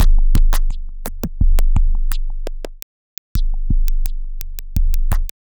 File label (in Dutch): Taptoe